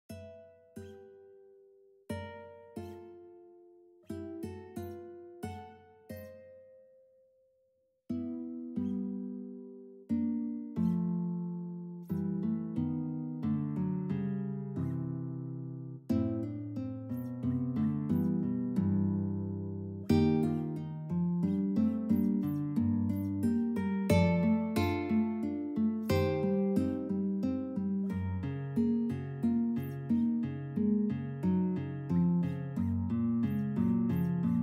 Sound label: guitar excerpt